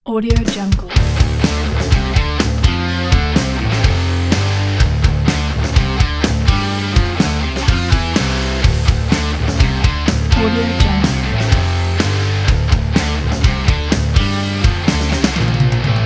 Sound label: upbeat heavy metal